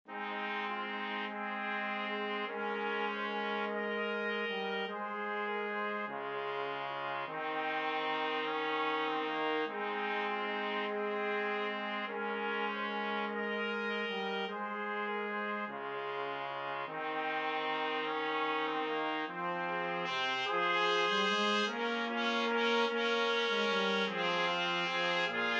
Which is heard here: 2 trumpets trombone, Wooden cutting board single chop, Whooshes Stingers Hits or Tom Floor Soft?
2 trumpets trombone